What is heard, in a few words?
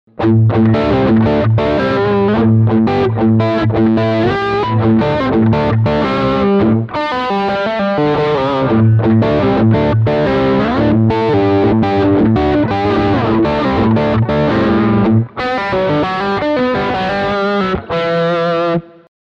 crunch riff middle position